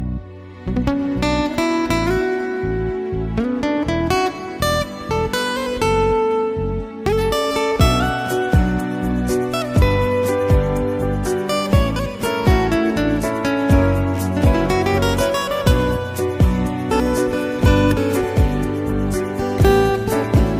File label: accustic ringtonwe ringtone